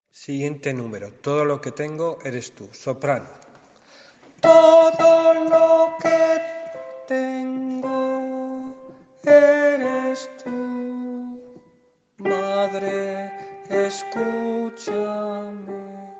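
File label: soprano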